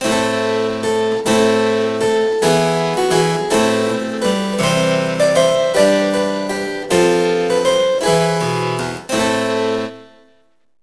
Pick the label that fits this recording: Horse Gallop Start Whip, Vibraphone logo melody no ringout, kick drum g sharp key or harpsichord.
harpsichord